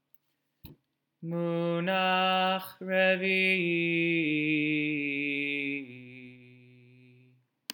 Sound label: Torah Trop Pattern